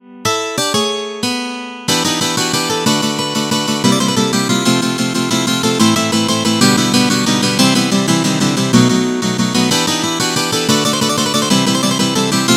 Steel String Acoustic Guitar; GuitarTempus; Syntheway

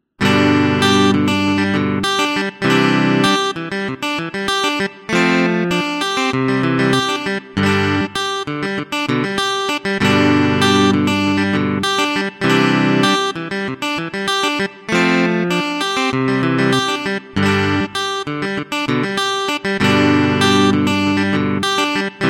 Semi Acoustic Hollow Body Electric Guitar; GuitarTempus; Syntheway

